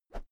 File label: whoosh thick; swooshes-swishes